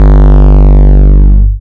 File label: 808 g clip